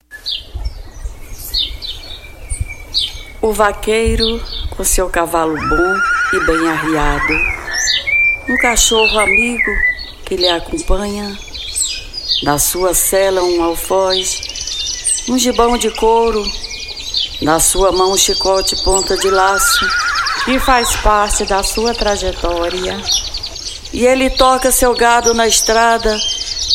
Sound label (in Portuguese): sertanejo